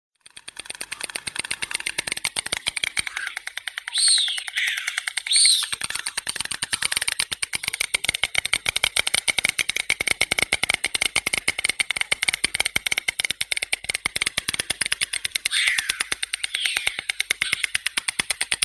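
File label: bird voices, white stork, Ciconia ciconia; aist belii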